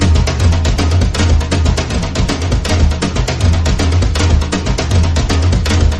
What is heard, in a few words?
drums; horde